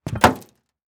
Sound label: Impact Metal Bang; Impacts